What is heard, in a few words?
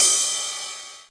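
Yamaha RS7000 hihat cymbal, Drum machines; Yamaha RS7000 Cymbals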